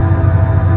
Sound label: Generator